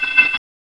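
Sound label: computer; quake2; mnhcoop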